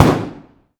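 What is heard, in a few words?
lmg sup urb int close rear player; gmod; viper; atmo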